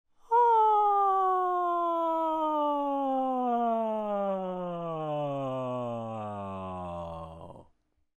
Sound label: High Low Falsetto